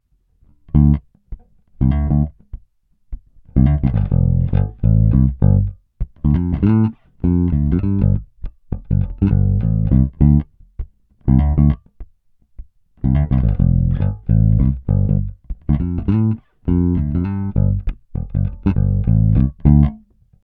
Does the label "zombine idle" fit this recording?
No